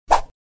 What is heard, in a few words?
Swing